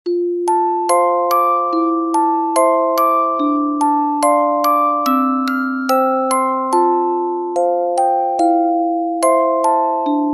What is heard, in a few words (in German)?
orgel